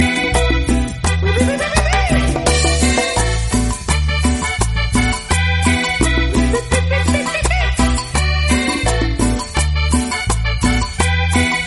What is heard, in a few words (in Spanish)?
cumbias